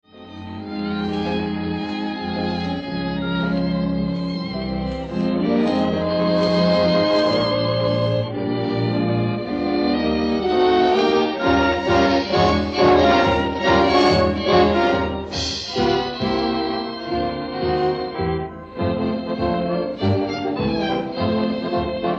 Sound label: Instrumental Medley